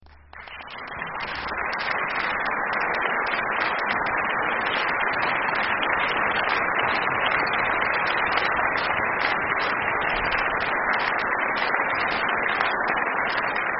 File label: Applause